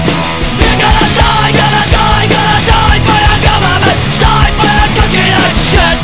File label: music